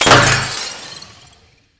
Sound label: Xp orbs deny